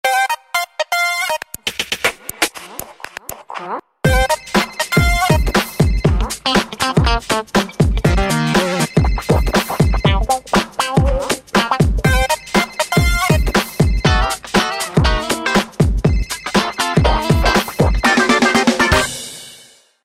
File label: Ring a ring, ringtones; Samsung; Galaxy Ace Dear SCH-i619